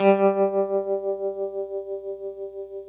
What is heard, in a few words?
GRTCH TREM G2; Sonic Reality; American Heartland